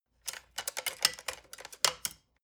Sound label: decoding machine handling rattle and clicking